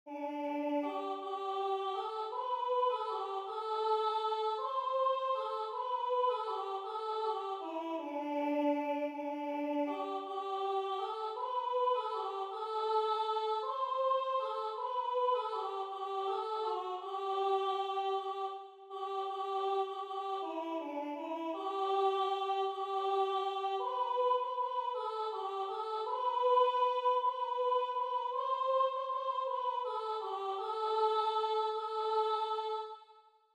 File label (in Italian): alleluia soprano; alleluia irlandese